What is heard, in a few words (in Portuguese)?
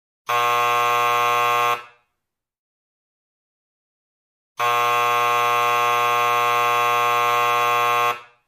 campainha